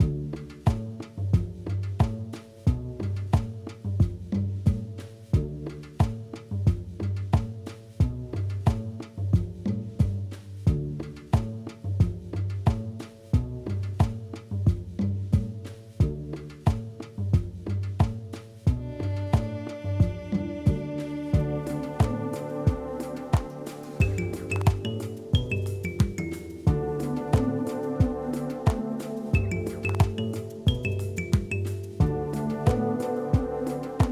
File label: Forest Before Rain Music; Tukoni